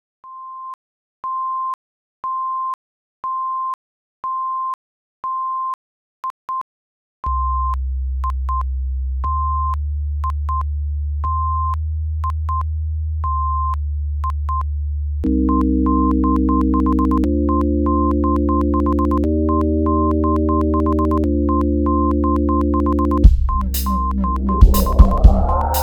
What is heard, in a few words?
Sinewave